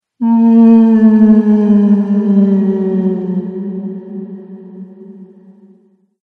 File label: Ghost Spooky Moan; Ghost Sound Effects; horror